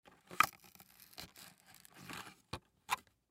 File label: Plastic Wrap Close, Plastic Wrap Close Box; Materials; Household; Blastwave